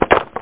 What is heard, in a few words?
Stamp down